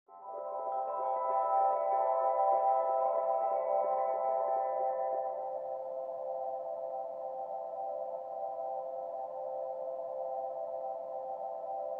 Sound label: ambient